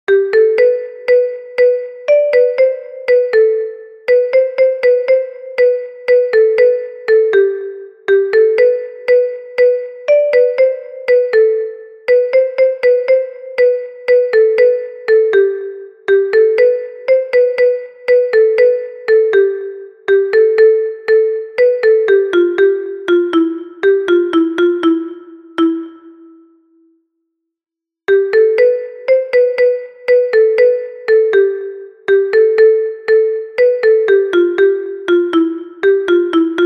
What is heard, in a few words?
kalimba